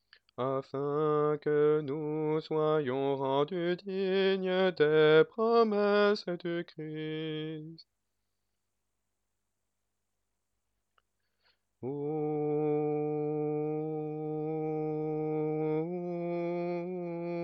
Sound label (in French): basse; Angelus oraison; mariage